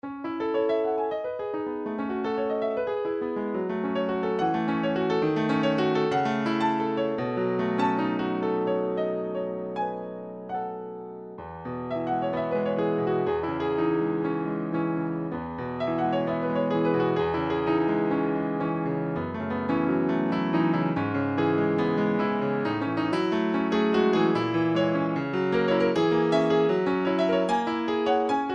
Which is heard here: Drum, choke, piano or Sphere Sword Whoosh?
piano